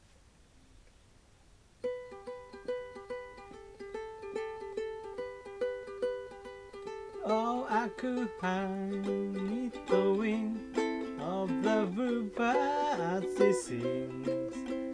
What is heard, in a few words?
ukulele